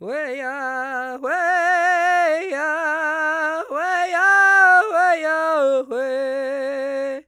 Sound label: vox